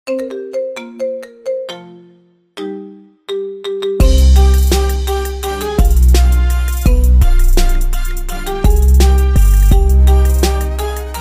Halloween Marimba

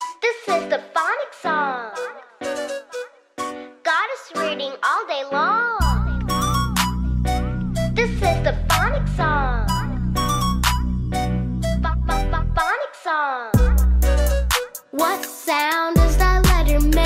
Phonics Song Letter Sounds

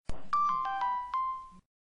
Original Tone Sms

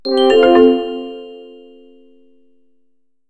Marimba Magique

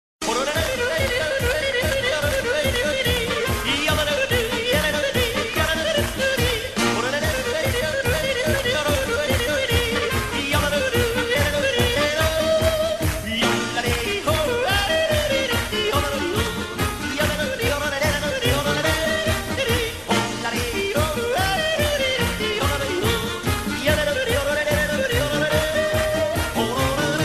yodeling

